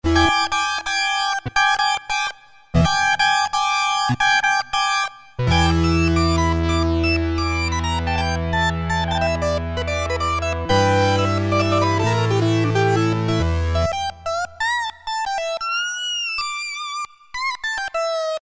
Lead Synth, Roland MKS-50; Factory examples

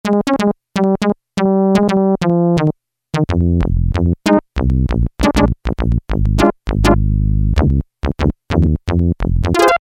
PERC BRASS, Yamaha DX7

